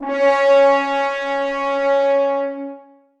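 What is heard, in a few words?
horns sustain c#4; Sonatina Symphonic Orchestra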